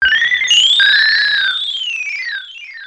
robot death

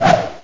SWOOSH; CD Action 24b; SPEL

